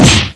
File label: rifle whack; blackshades